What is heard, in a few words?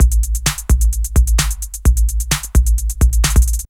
drt 130 electro, DRUMLOOPS, LOOP ELECTRO; Ueberschall; Techno Trance Essentials